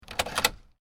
Car: Unlock Door, Exterior; Car Sound Effects; Cars (Sound Effects:Vehicles); Stuart Duffield

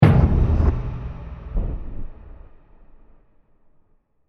Mysterious Impact With Heavy Reverb; Bursts; Impacts; Sound Effects:Impacts; CraftedSignals

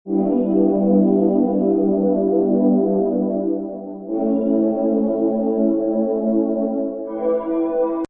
SYNTH PAD 120, Synth pad loop 120 bpm.; Synth Loops

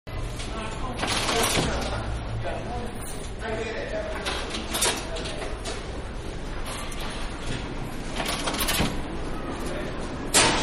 Subway Platform Turnstile Train, Ambience; Subways; Vehicles; Subway-Turnstile And Train Ambience

